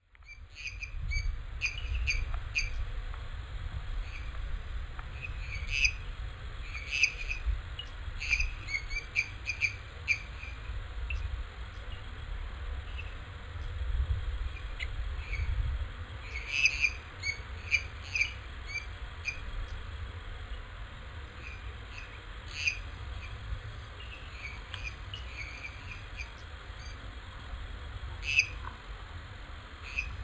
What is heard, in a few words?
Dendropsophus minutus